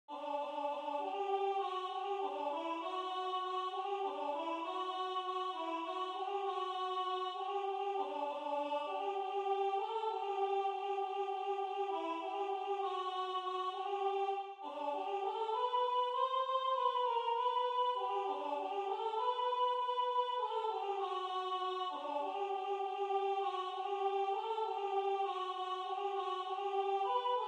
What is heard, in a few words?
Alto; Entzun Abesbatza; Atzar Gaiten; A. Lesbordes